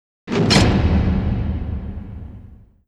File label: Jail Metal Door, With Lead-In; Berlin Atmospheres